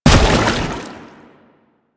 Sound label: Step Water, Footsteps; Warframe; Lasrian; Lasrian Soldiers; Foley; Lasrian Chem Strike Nox